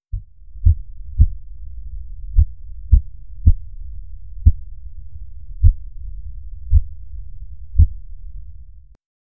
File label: power up aura with calm; heartbeat pulse